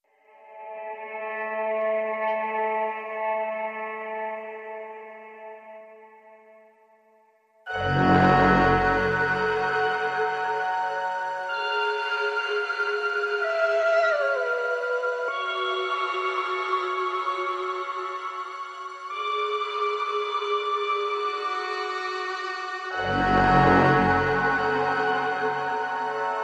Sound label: Atmospheric